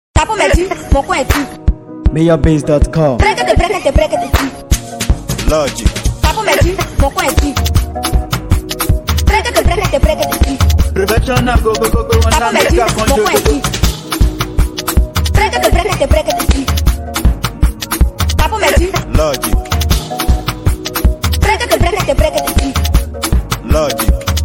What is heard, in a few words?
Professional Beat